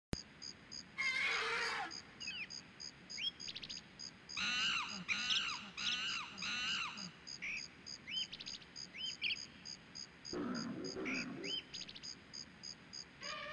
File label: Jungle Ambience; Donkey Kong 64